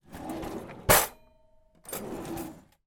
household drawer cutlery kitchen open then close

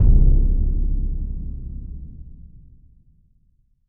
Impact Boom Rumble; bottlerocket

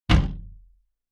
household wardrobe door close freestanding wooden

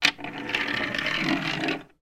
toy wooden train down then roll on wooden surface

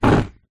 nature fire flame air wind blow blast fast short

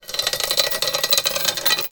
industrial chain med pull over metal pole

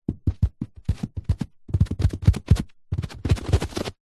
cat run play carpet

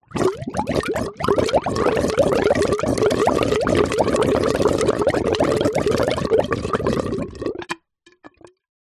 water gurgle bubble could be blocked drain; nature

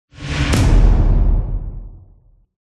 CINEMATIC HIT Heavy Drums Short; smartsound

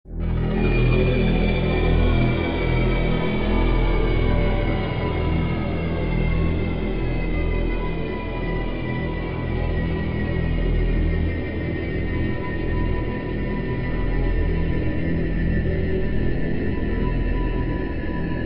sound design horror drone atmosphere

